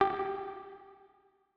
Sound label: fireworks, bubbles